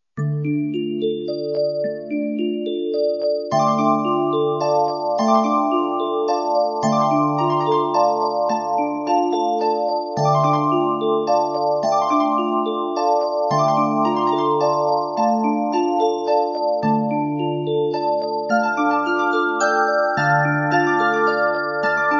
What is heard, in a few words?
Arab